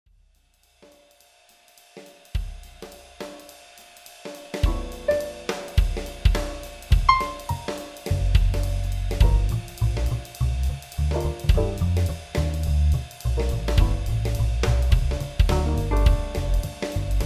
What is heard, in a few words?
midi jazz